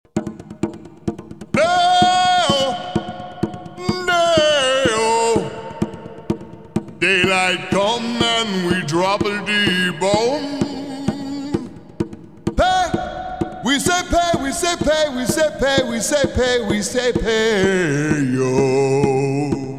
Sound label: Bomb Song; Bin Laden